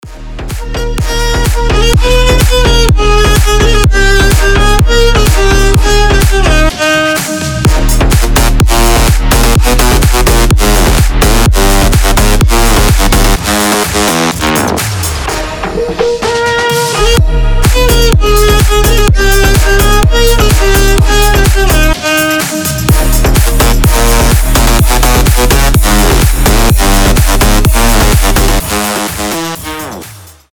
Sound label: cello mantra